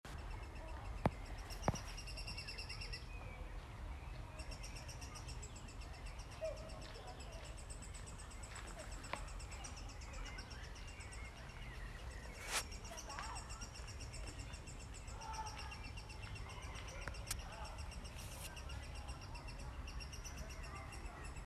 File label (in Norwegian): elvesanger